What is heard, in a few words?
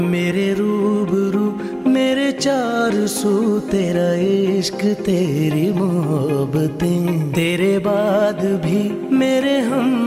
Love Song Ringtone